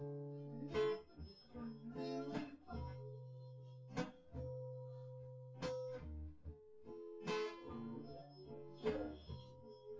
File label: nodrums synthconv; SiSEC10; underdetermined